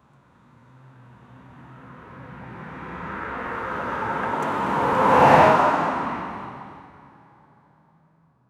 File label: cinematic car passing by; motion